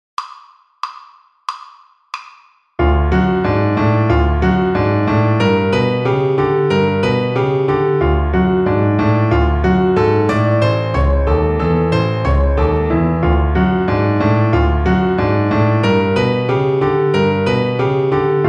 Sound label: String Gym, Piano Accompaniments